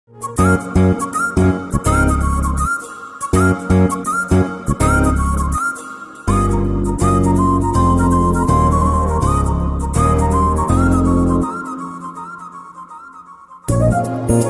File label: Romantic Bgm; Mr. Majnu